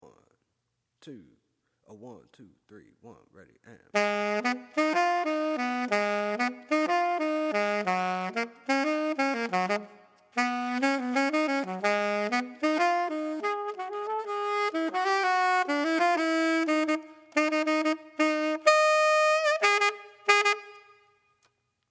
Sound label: jazz alto latin; jr. high jazz cycle a